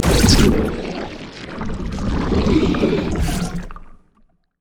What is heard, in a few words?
emote death; LoL; zac base